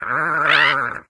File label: Scarecrow Boo; Farm Mania 2